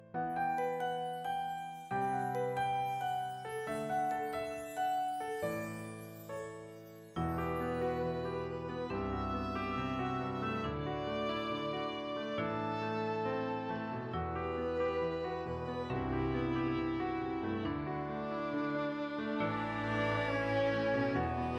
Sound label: Audition Accompaniment